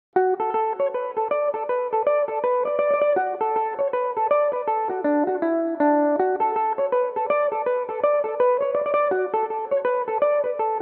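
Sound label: D major belmuse, irish mandolin; lovely lassie winking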